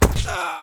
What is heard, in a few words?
fall, players male; warfork